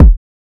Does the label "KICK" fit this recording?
Yes